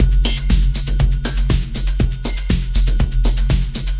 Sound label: Tech Rhytm